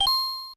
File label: Get Rupee; Get a rupee (full sound; also making a selection on the menu)